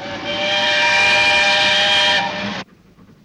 single note

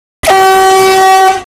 class 66 horn

